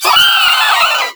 Volatile Runner Kamikaze Scream; Warframe; Proto Infested; Abilities

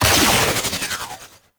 Cargo Train Exit Energy Power Down; Warframe; Corpus; Railjack

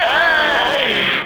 Inked Humanoid Pain; Enemies; Warframe; Shadowgrapher

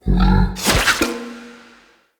Camel Idle Spit; Zenith Camel Vendor; Vocalizations

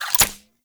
Pump Shotgun Reload Shell B; Weapons; Warframe; Zariman

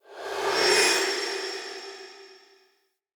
Sword Throw Wind Up; Weapons; Player; Swords; Fenn Jotar Sword

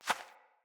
Beaver Footstep; Foley

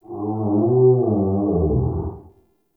Inside Vocal Groan; Ambience; Bromius

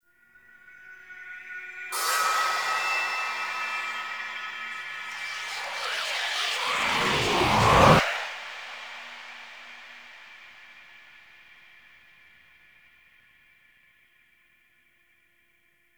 Void Echo Control; Warframe; The War Within; Mountain Pass Return Peak; Cinematics

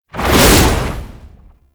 Medic Shield Drone Throw; Abilities; Warframe; Tau; Dax Medic

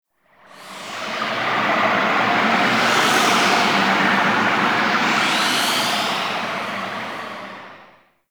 Exterior Windy Passby, Ambience; Warframe; Railjack

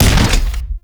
Shotgun Fire Shot, Double Barrel Shotgun; Kuva Sobek

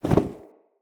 Leather Flap; Ambience; Soul Plane; Vendor

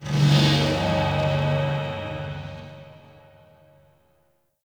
Tech Moan Texture Med, Corpus Ship ambience; Textures

